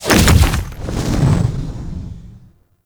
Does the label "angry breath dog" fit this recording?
No